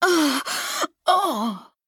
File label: Death